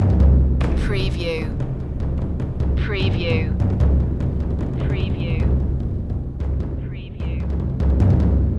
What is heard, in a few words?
taiko triads loop